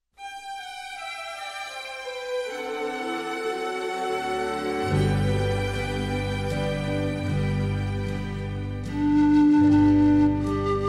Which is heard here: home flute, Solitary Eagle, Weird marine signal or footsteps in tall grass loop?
home flute